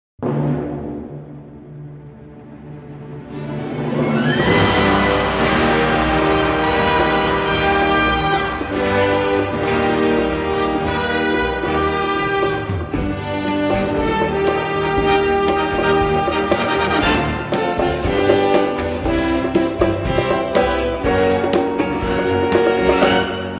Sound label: fanfare